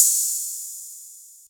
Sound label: small reverb open hat sound a key